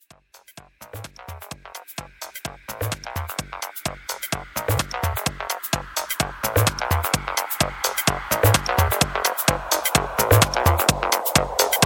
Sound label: House 128 Bpm